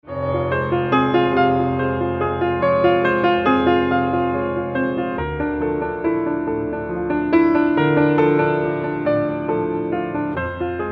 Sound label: Triples Fast Tempo